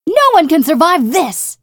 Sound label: FEMALE MELEE ATTACK; combatarms